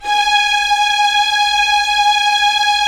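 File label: Strings Violins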